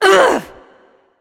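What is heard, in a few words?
ugh, female; smite; voicepack; hera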